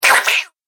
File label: Night Gremlin, Monster; horror